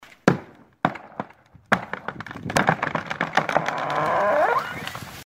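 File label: derevo treskaet, tree cracks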